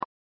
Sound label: click; Skillzgaming; fruit Blast 92 Desktop